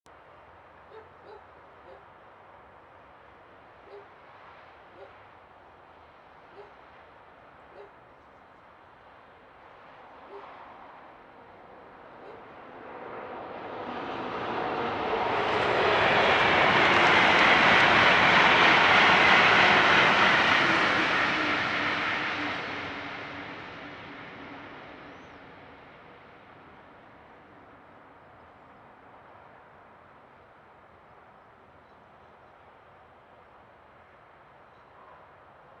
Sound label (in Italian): Treno mono; Eigenmike; A-weighted; Nuova Strada